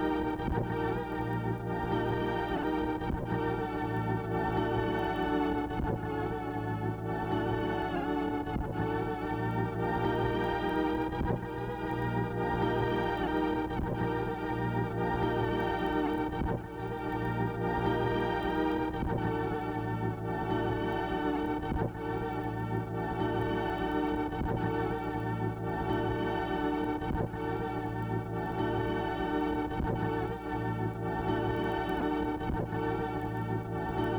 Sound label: tape loop